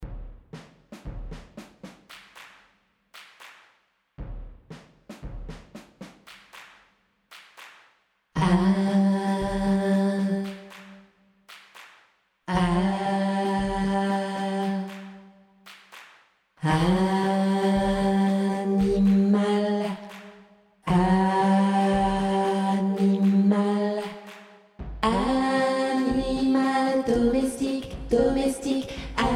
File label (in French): alto; animal domestique